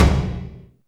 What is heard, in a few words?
TOMS; SALVO; Back In Time Records; Big Bang